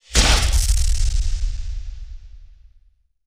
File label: Flash Frame Crackle, Sci-Fi; Sony Pictures Sound Effects Series Volumes 1-10; Sony Creative Software